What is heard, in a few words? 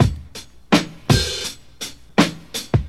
Drum loops Boombap